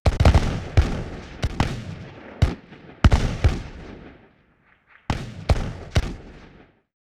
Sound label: Musket Volley Distant; Period Combat